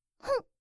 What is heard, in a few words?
hmmphh